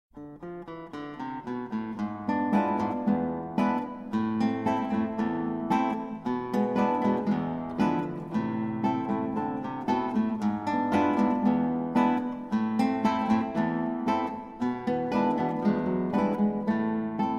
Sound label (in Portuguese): Guitar Danças Populares Brasileiras Xote (Dança Popular do Norteste)